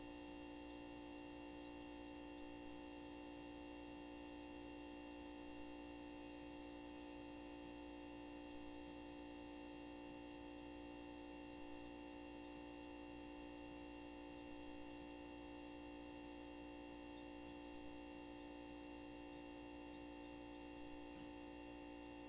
Box Freezer Loop

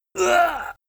Death Cry

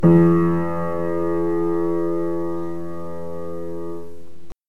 Piano

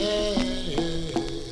Vocal

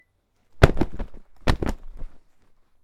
Cloth Flapping

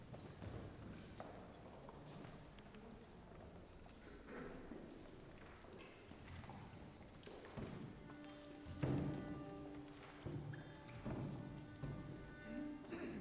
Calm Orchestral Band

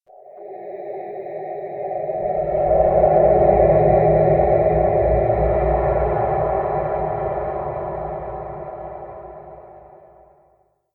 Planet Atmosphere

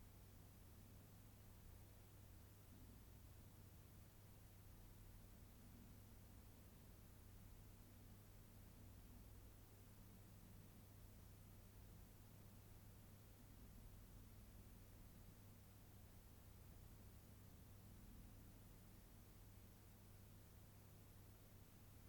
Basement Room Tone

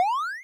8 Bit Jump

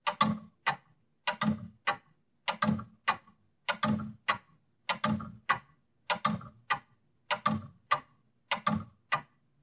Old Clock Pendulum Ticking

